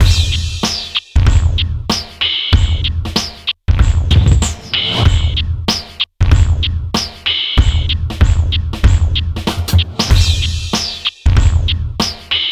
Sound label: TRIPHOP; INIS